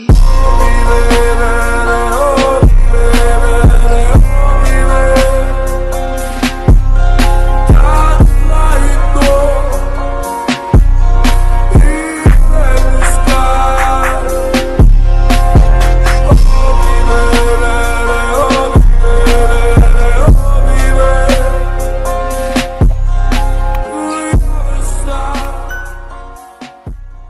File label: english romantic music ringtone